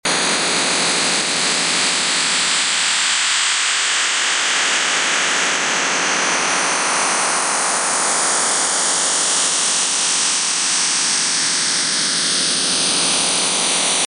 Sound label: DRM signal